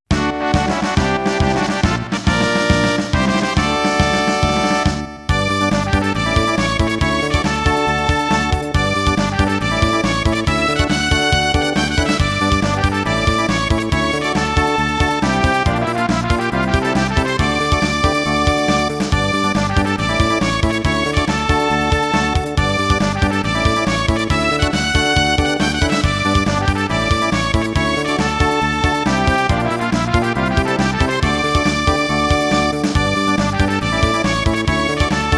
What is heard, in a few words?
fanfare; Chrono Trigger